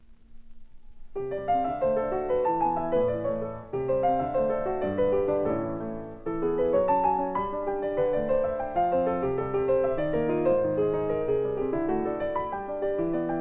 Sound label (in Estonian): Improvisatsioonid